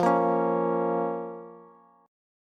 F Suspended 4th Sharp 5th F, Bass

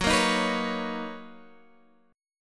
B Major 9th F sharp, chord

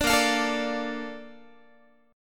A sharp Major 7th, Guitar; strummed

